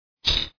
chip

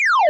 slot fast

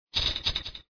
chip

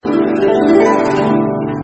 male cow

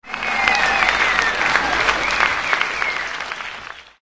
music win cup

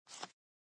music deal

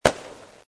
fireworks

